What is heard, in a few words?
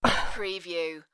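Female Exhale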